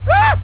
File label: scream; homer